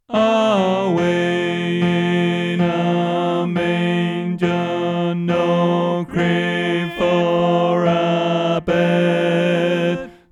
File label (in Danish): Bas; Stephans-koret; oevefiler